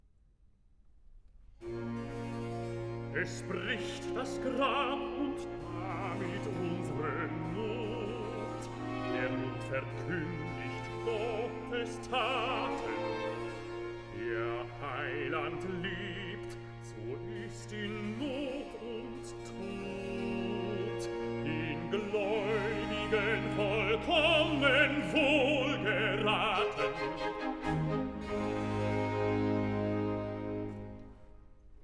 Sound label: Recitative; Bach; J S Bach; Easter Cantatas; Erfreut euch, ihr Herzen Cantata, BWV 66